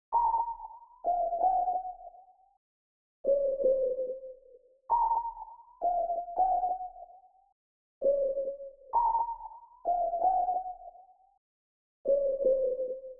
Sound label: 109 BPM A# Min Lead; Melody; Loop Stems; Cymatics; 2022 Melody Collection Platinum Expansion - Pt 1; Finish Line